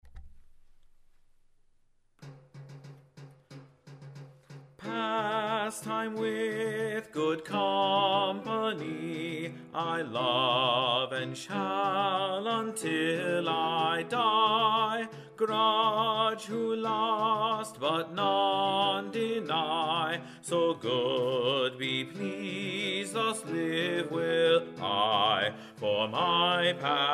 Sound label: Bass; Pastyme with Good Companye, King Henry VIII